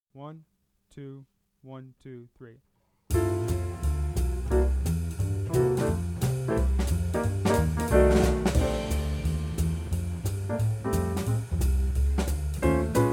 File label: backing track